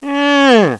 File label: Ehhhh!